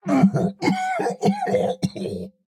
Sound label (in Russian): Кашель; Турбосвин